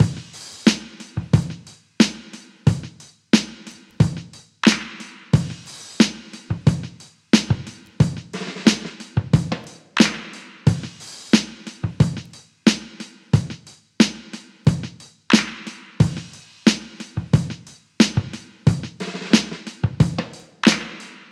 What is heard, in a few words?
90 bpm drum loop e key